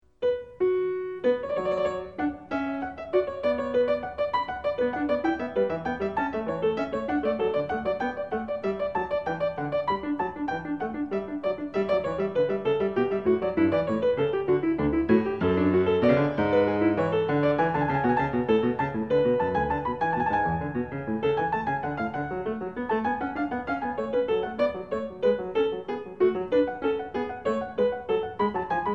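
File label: gigue, piano; bwv 814; French Suite 3; BACH; FRENCH SUITES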